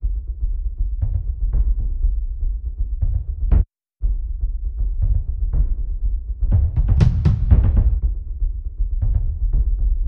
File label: Congas & Bass